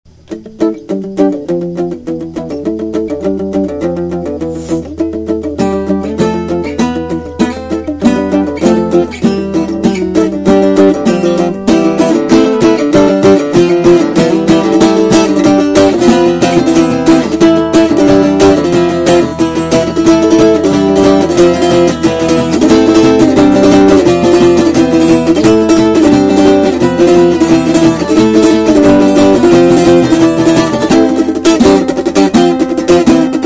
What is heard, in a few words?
Countdown instrumental